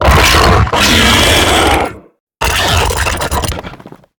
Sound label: Death; Evolve; Gorgon; Lurker